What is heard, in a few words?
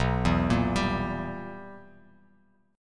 B Major 7th Suspended 4th Sharp 5th Bass Standard, chord; BM7sus4#5; arpeggiated; JGuitar